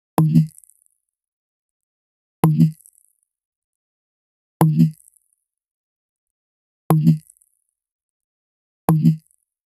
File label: game combo sound, puzzle